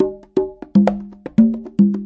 090 bongos, 090 bpm; Bazillions of Bongos

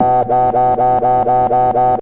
alarm bass; Basses

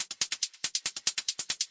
140 bpm verby click closed hats

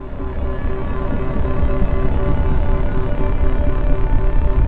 rising atmospherics; Pads

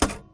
keypress, Typewriter; UNUSUAL KITS and FX; Bauhinian Vibes Typewriter Kit

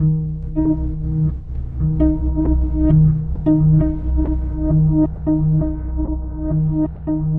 backwards forwards note, bass loops

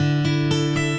acoustic guitar picking

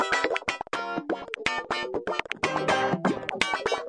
moody stereo wakka guitar; GUITAR LOOPS

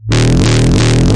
desecrated bass hit, evil bass sounds; Desecrated Productions

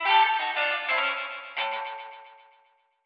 guitar descending ending spring verb

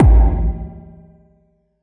verby tone E2, Verby Tonal Kit; UNUSUAL KITS and FX

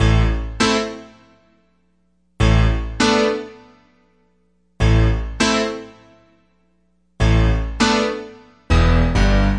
100 epiano simple hard chords; KEYS ORGAN PIANO LOOPS

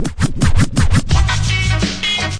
turntable scratch, Scratching and Turntablism

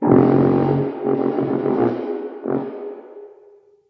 alien bass response; melodic samples; sampled music; close encounters

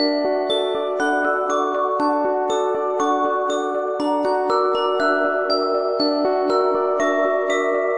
wistful bells double; STRINGS ORCHESTRAL CLASSICAL LOOPS

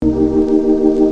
soft min7, organs

